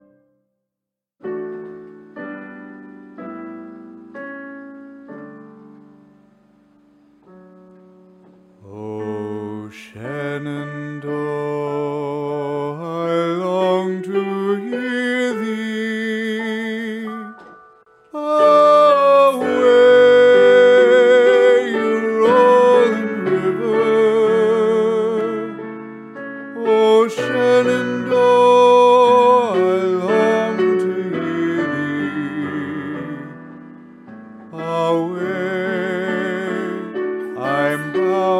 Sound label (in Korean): 영어남, 노래, Classical; 남자